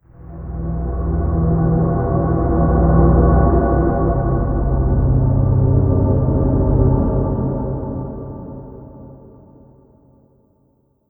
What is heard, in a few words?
ambience, horror; Sprunki Secret Horror; sprunki void